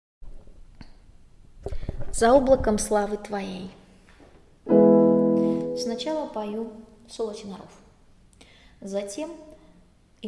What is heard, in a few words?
bas; Za oblakom slavy Tvoej